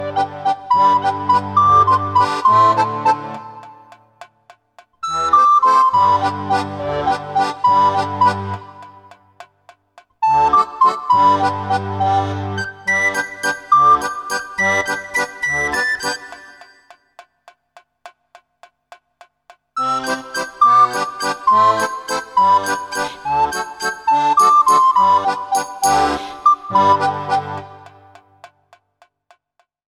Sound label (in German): Schottischer Tanz mit Pausen